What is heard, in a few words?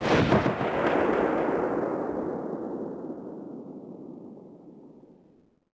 imperial sniper 1shot echo